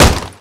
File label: foley generic kick body